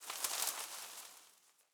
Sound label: leaf rustle twiggy hard